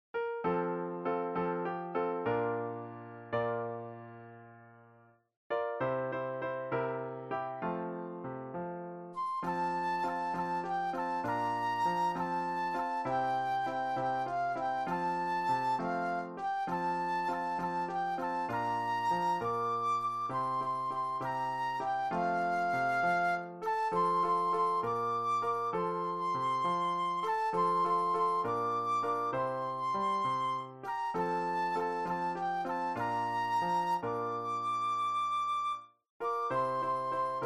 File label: Flute Solo